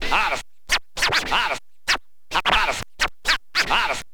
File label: scratch short, Vinyl scratches